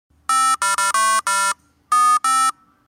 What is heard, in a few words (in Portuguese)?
Melodia